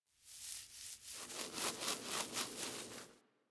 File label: Foley Interact Sleep Scratch; Enemies; Kubrow; Warframe